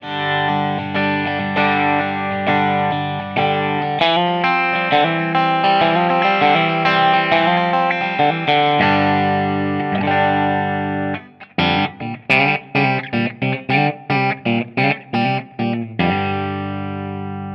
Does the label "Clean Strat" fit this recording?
Yes